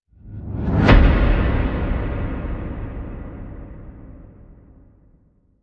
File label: Hit Big Man Down